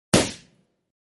Balloon Blast Sound Effect; Balloon burst

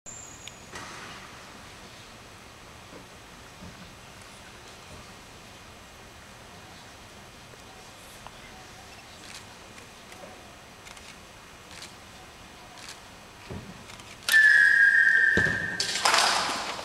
Bow

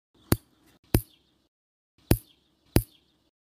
Nail clipper

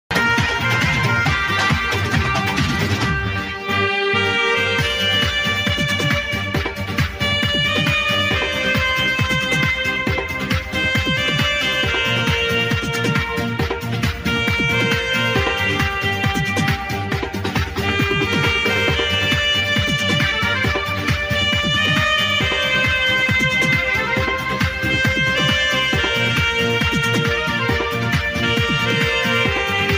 Saxophone

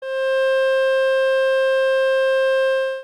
Water coming out of the phone

